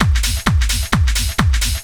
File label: straight, Techno Trance Essentials drum loops